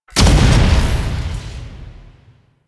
Ground Slam Impact; Warframe; DE; Sentients; Erra; Abilities

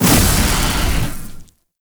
Survival Orb Destroy; Warframe; Zariman

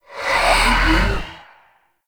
Rifle Void Charge; Zariman Rifle

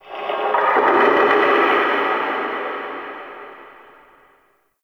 Under City Antique Rattle Distant

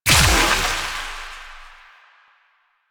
Explosion; Warframe; Weapons; Moa Pet; Multron

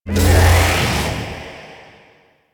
Bubble Shockwave; Enemies; Abilities; Defend Song Bubble Shockwave; Warframe; Teralyst; Bigalyst

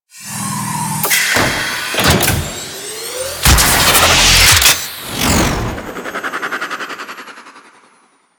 Liset Release Latch; Archwing Intro Cinematic

